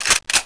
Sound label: m3 pump, benelli m3; garrysmod